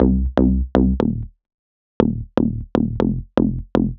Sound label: Skip Bass; Sonic Foundry (Sony Creative Software); The Electronic Music Manuscript Collection; Bass Foundations